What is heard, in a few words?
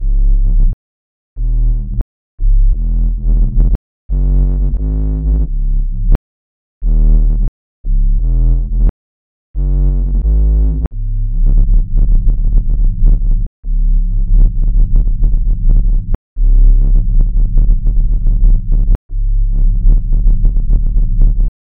Bass